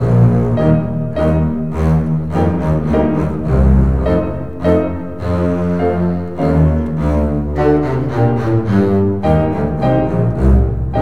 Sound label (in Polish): kontrabas